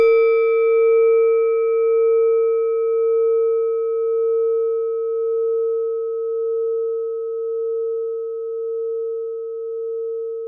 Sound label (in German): klangschale nepal, Klangschalen Nepal